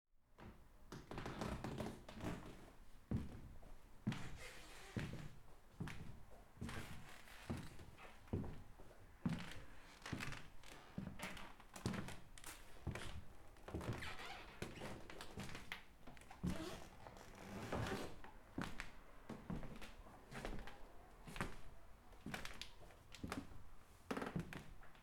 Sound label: Squeecking Footsteps